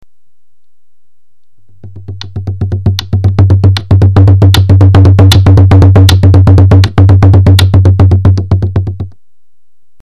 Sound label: click jig